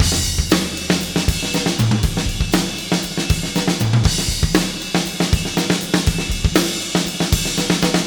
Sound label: Beat Loops; Zero-G; Monster Beats